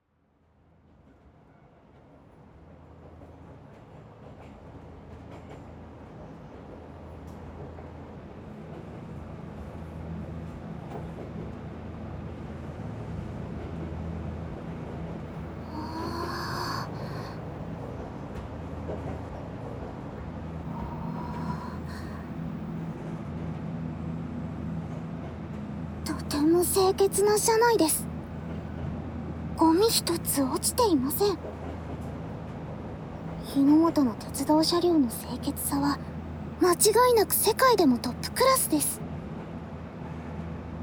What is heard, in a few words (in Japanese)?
列車内環境音; 釣子に行くならまずは猫吠; 釣子電鉄列車内環境音